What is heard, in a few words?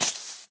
say, creeper; minecraft